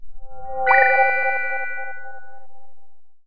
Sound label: alarm unit turn; interface